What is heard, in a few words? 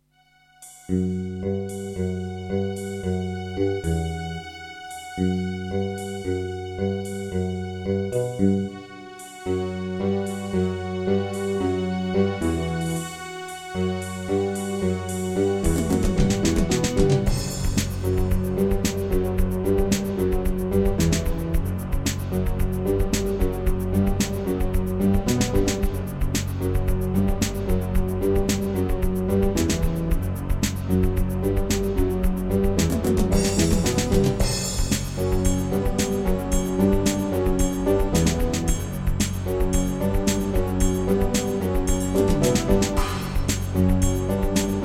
EMU SoundEngine; Duke Nukem 3D